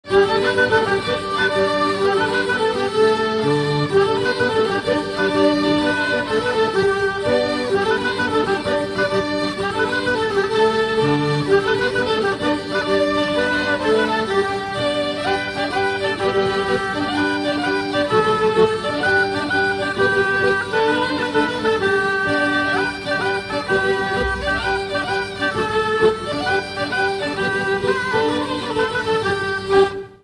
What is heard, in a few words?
cock jig